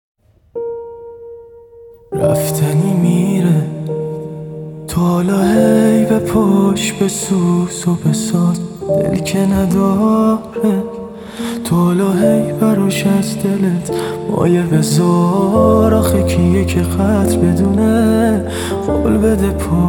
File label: Version Piano